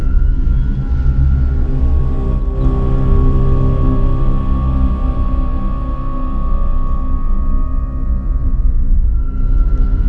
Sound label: ambience